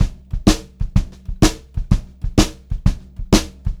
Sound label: VARI, Pop-Rock Drumloops; USB Soundscan vol.37; AKAI; 90 s Sample CDs